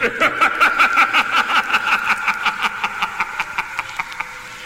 laugh devil; ooh ahh

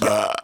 burp short

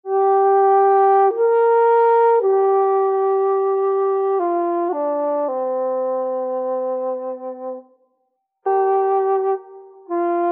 flute tribesome, Loops, Sad

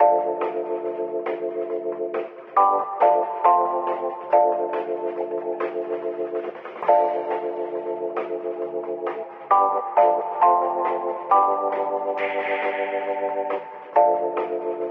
keys, Phonky loops

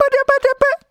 bawda bawda burr; ooh ahh

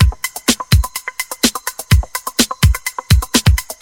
Drum loops, Electro

